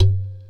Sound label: Djembe cheap mic session